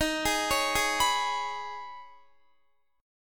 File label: D sharp 7th Suspended 4th Sharp 5th D sharp, Guitar; arpeggiated; x,18,20,18,20,19; 4F,4A,5C,5G,5C,6E; D#7sus4#5; JGuitar